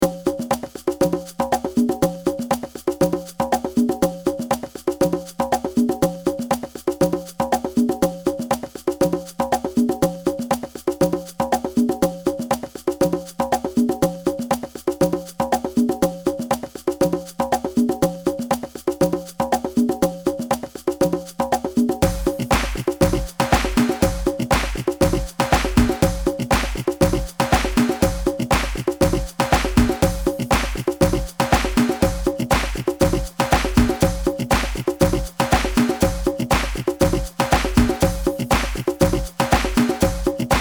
fondo carnaval

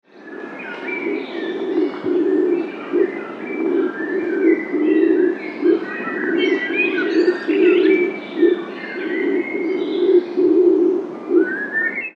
Paloma Turque

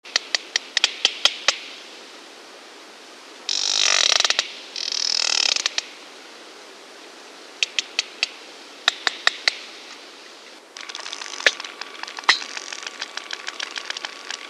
Cachalote

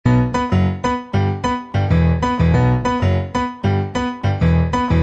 piano; ecoescuela

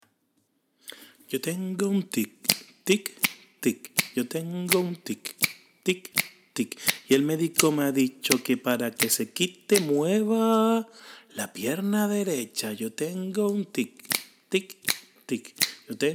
cancion

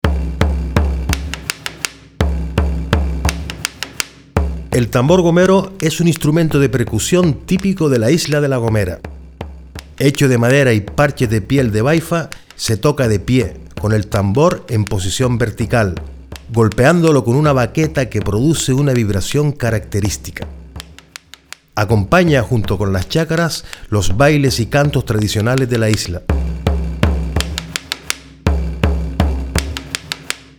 Tambor Gomero

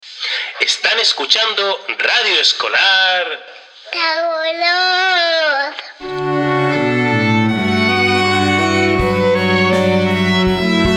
sintonia radio escolar; tagoror

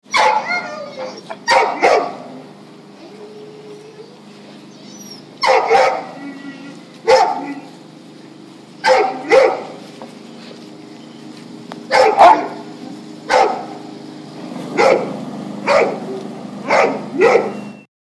Presa Canario

